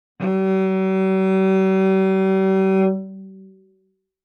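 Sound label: Cello arco fortissimo sul G Gb3; MIS Pitches - 2014; Strings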